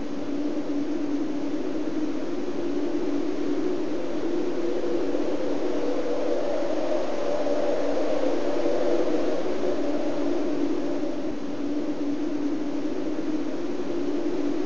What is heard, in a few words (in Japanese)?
風 (Snowy Forest)